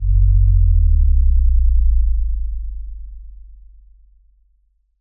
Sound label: synth fall; soundscrate